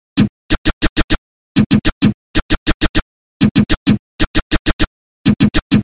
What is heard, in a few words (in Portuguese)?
Base De Funk